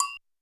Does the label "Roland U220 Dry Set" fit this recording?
Yes